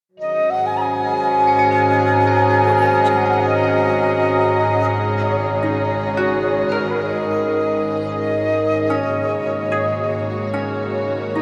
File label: japanese flute logo; juicypulsemusic